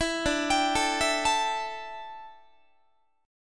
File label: D Suspended 2nd Suspended 4th D down Guitar